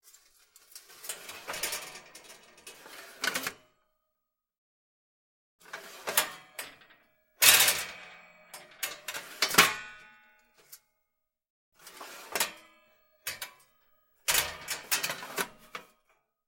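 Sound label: sounds of toaster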